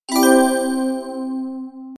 Sonido Fantasy

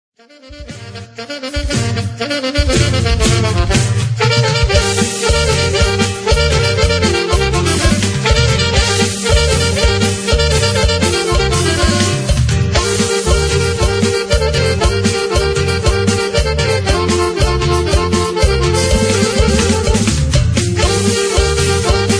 cumbia, norteno

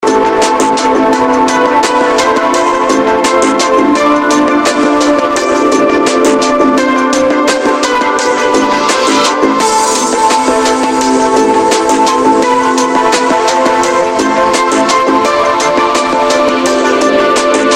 Musica liquida

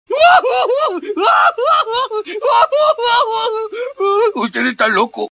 risa de homero

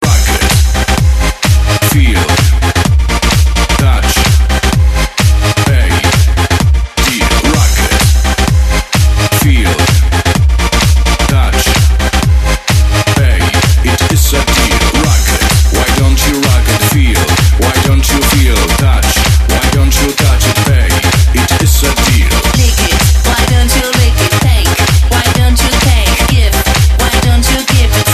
reggaeton bueno; latino

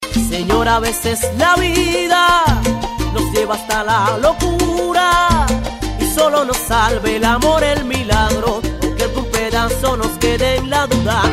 habanera

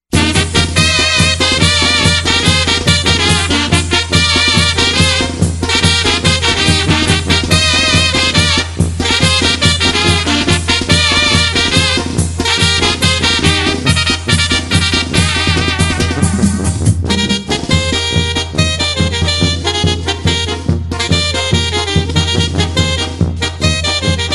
tamborazo; latino

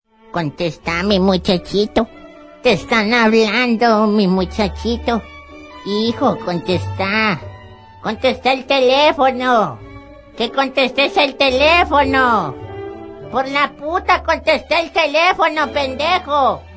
tono para celular

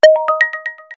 Tono Ascenso

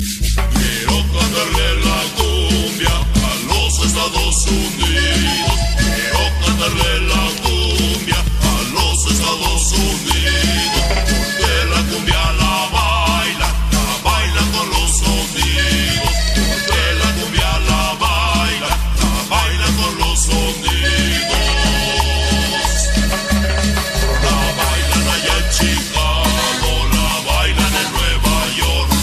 La Cumbia

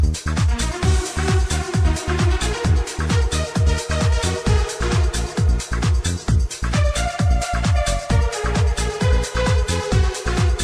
remix

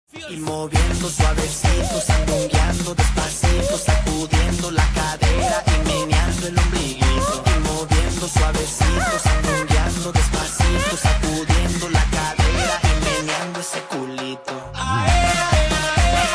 cumbia tribalera

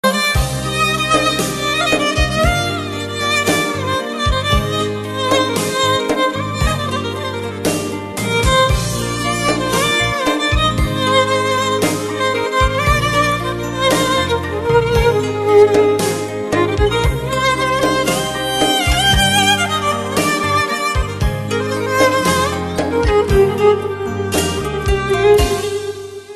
classical

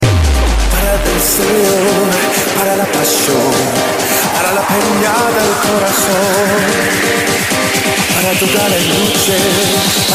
latino house

